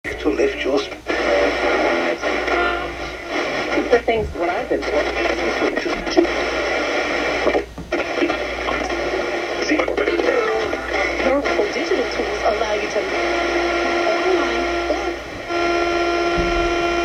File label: radio